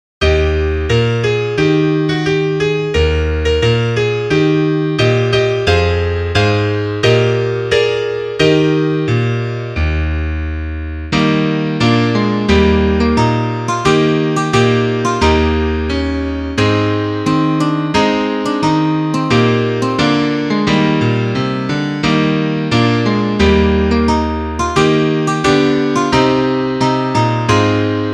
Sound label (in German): Klavier Solo, trompete; Aus Amerika